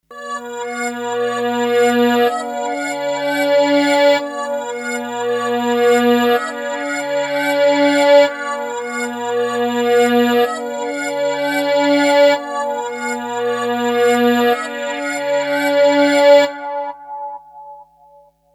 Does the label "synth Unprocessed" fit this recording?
Yes